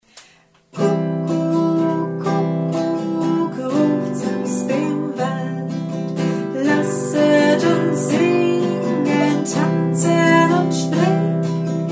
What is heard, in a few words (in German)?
Kuckuck Kuckuck rufts aus dem Wald; kinderlieder memory lieder